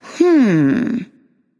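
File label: thinking, female; smite; voicepack; hera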